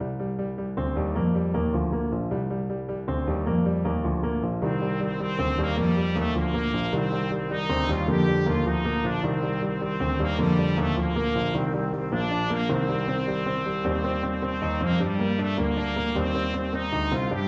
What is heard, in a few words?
follow me to carlow trumpet